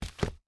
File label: Foley Telephone Book Set Down